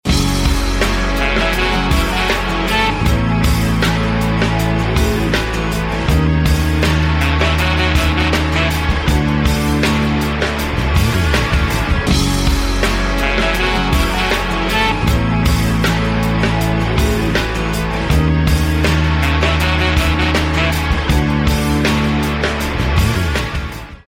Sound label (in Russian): folk blues muzyka; iz kakogo to yaponskoe anime